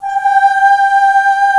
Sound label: vocals mix; Greytsounds VOL-1